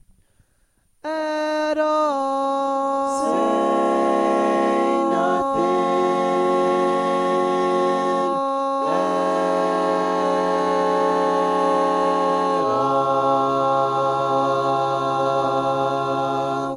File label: Lead, barbershop tags